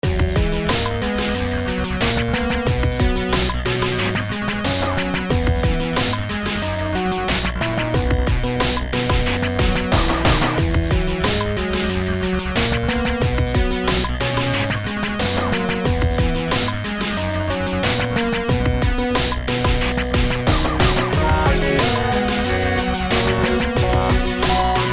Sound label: techno